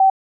menu; Samsung GT-I7110 Pilot; keytone; dtmf

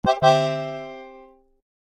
Concertina; Samsung Galaxy S22 SM-S901U

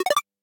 Unlock, phone ui; Retro Riffs

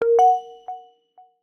Woodblock Echo; Samsung; Galaxy A56; Calm

